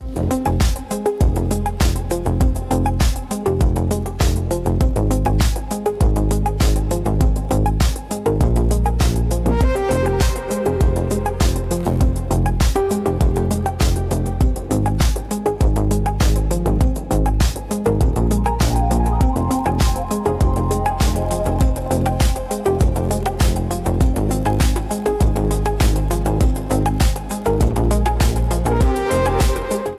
factory rhythm; industrial zone; music

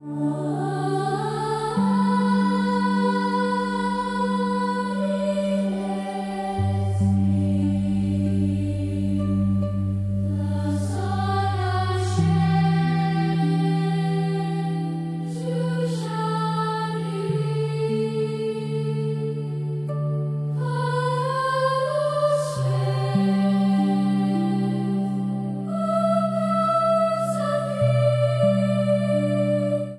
angel choir

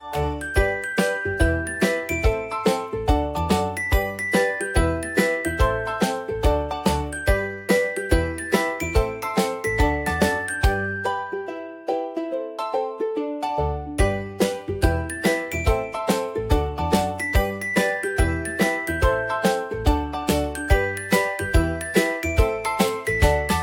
playful mood

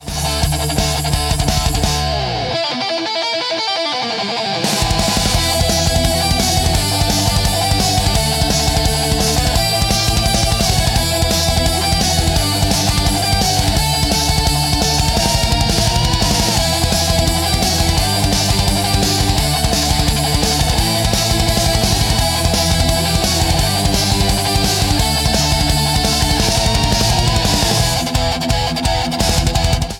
fast lane groove

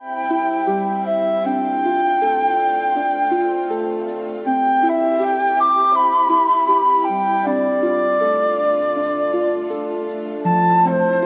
lullaby

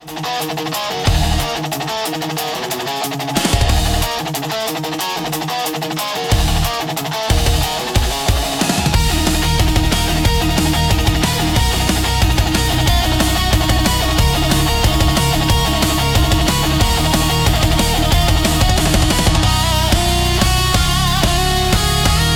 guitar storm

